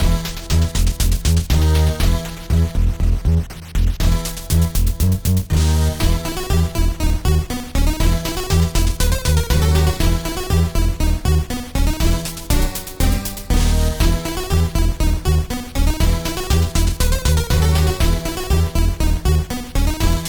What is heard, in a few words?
music kingdom spook loop; Skellboy